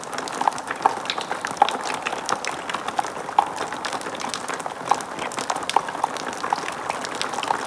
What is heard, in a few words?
cave; de junglia